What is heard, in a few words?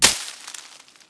bush; Mafie 1